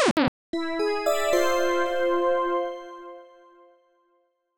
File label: lose music